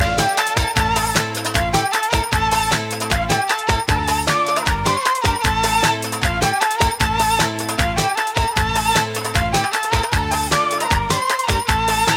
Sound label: Music